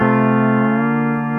PEDAL STEEL

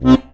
HOHNER B, akkordeon; Best Service; Alpine Volksmusik

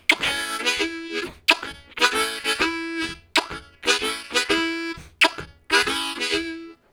Kissed, Harmonica; Zero G

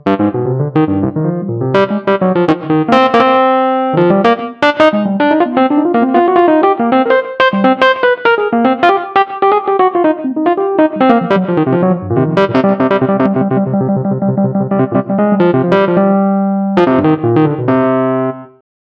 Rhodes Solo, Cinematic Funk

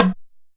JUNKU HITS; Best Service; World Colours

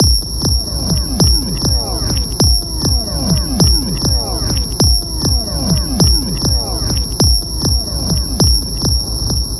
Timewards Beat; Sonic Foundry (Sony Creative Software); Loop Noir

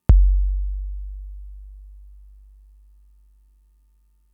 Kick, Drum Machines Fricke MFB-522

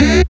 SYN OLD, dance synth

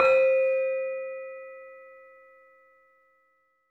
LAMEL C4 -R; USB Soundscan vol.60; AKAI; LAMELOFO

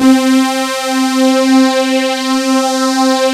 FUZZY LEAD; Pro-Rec - Synthsonic; TRINITY

